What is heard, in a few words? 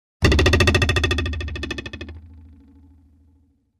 Arrow trembles stuck in a tree; cold weapons